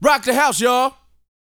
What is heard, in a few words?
VOCAL F; ILIO